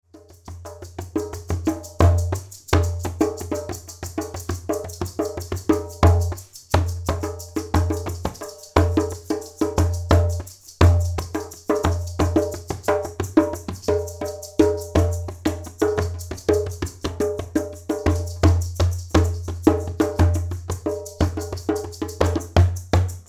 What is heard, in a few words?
Drum